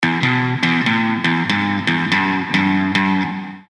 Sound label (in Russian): basisto; soobshcheniia sms